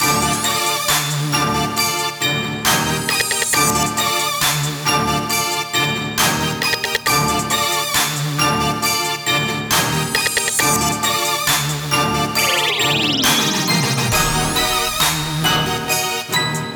Trap

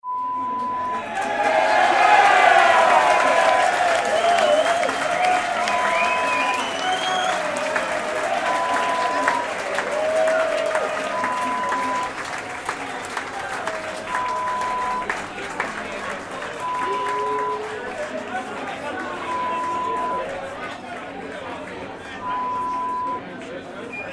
Football crowd sound

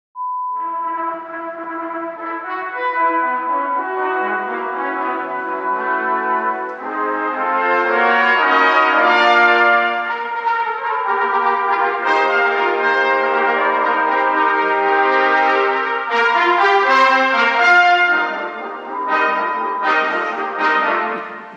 Trumpet fanfare sound

